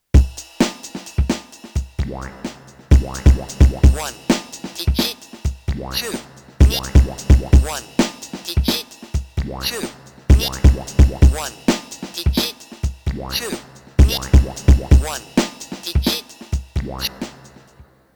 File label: LoFi 12XT Pattern